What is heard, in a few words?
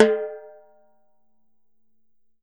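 TIMBALE, Salsa Kit; E-MU Producer Series Vol. 7 – Old World Instruments; Drums&Percussion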